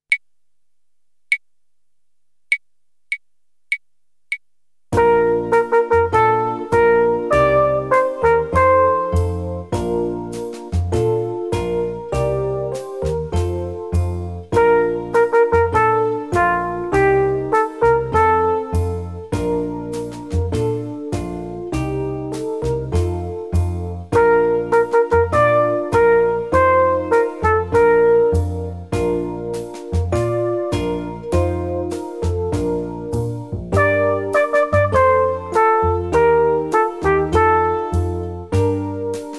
Echo Ubung

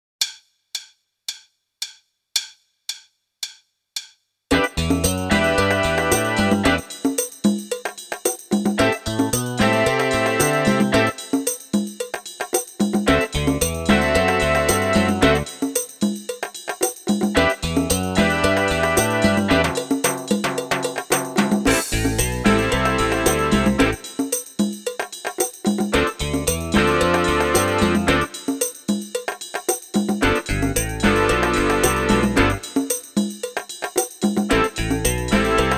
Snare Bass Drum Kombinationen; Meespeel CD

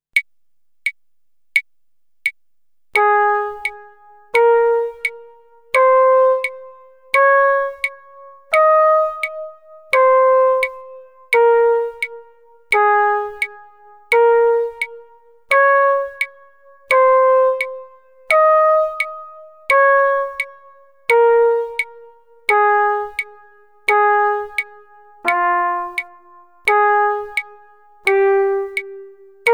Echo Ubung

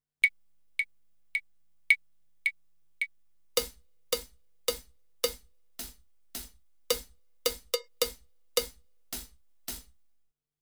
Rhythmus Schreiben

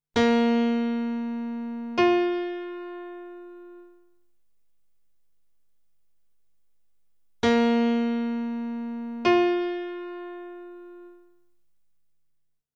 Intervalle Quinte

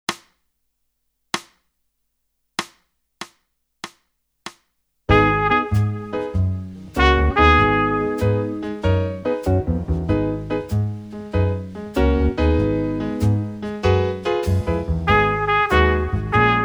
Echo Ubung

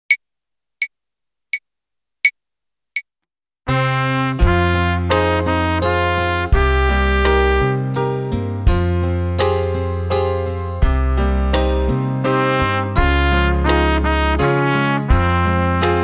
Echo Ubung

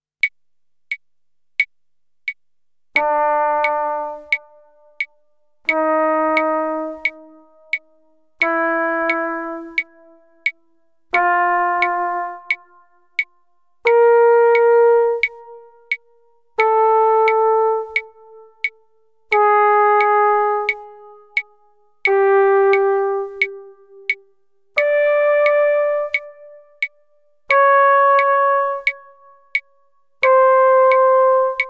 Echo Ubung

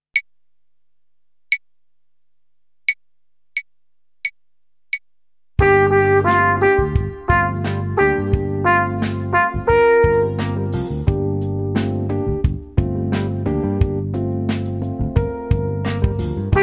Echo Ubung

